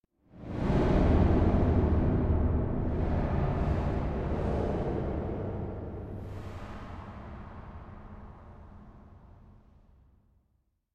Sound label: Impact Metallic Distant - Distant Hits And Voices; Hollywood Edge; sound designer's toolkit v2